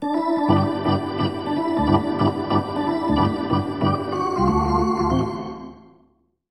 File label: Hockey Organ